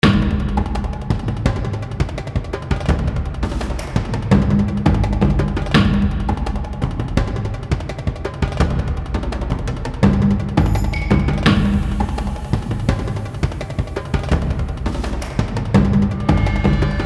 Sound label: Drum Run